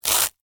Crunch Floral Foam, Texture, Crunch, Short, Floral, Foam; Rip, Tear; Crunches